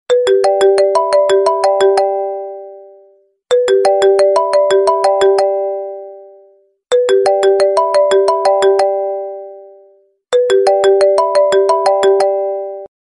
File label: apple i phone